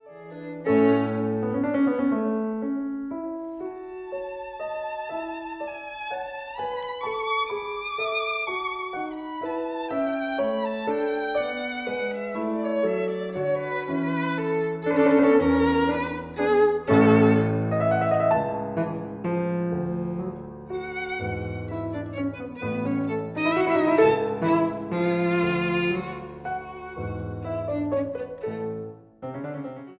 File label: VIOLIN